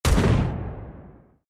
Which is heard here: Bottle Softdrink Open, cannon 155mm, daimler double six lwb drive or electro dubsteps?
cannon 155mm